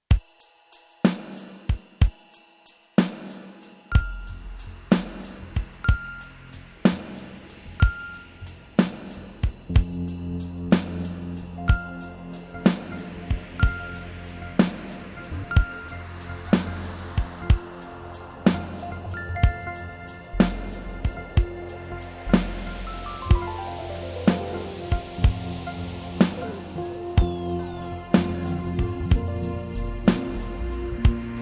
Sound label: Echo of a Memory Instrumental